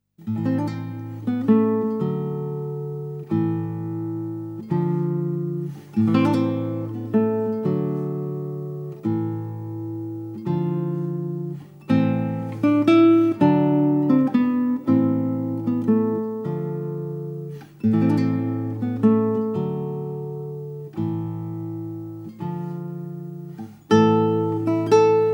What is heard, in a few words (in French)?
Berceuse; Alexandre Tansman; South American music for guitar